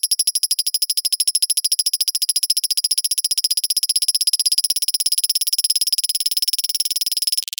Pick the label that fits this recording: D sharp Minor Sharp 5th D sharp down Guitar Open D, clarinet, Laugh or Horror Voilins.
Horror Voilins